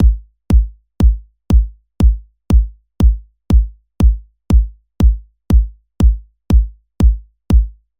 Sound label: Kick Subbass Air Tape Pressure; KSHMR Essentials